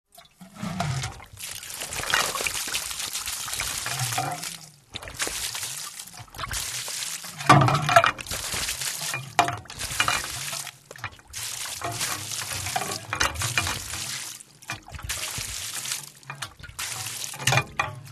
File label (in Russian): zvuki kanistry